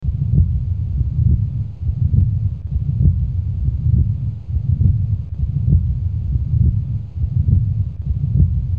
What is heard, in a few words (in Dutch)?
hartslag